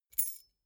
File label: key ring small rattle air soft